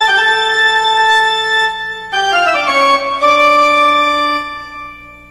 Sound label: Halloween Creepy Organ Music Sounds Car Horn